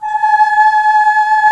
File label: MIXED 4, ORCHESTRA; Roland - LA Composer vol. 1